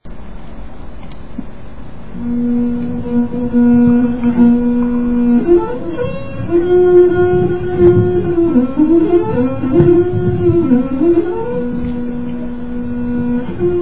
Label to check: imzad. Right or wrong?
Right